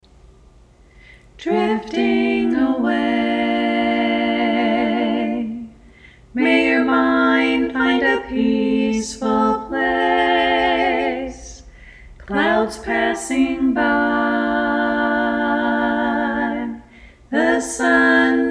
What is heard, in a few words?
Only Vocals, choir